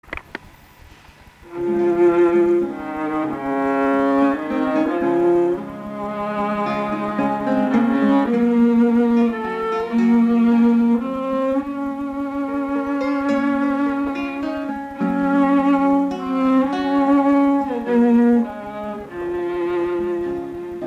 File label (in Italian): Ula Ula strumentale